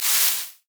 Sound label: brush on movements